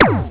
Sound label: twoooh